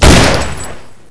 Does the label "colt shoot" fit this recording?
Yes